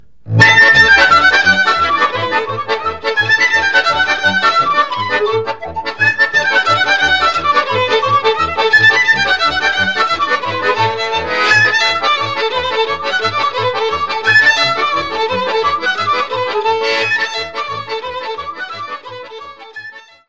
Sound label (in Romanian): Sirba de la Focsani, Roumanie